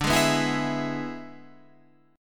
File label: E 7th D, guitar